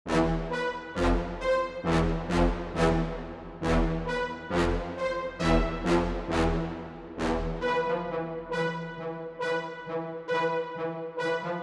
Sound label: C min 135 BPM Brass